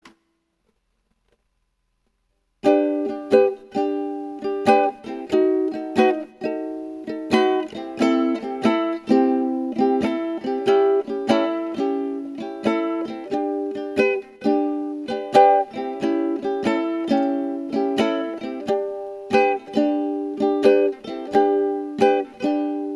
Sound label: (for ukulele)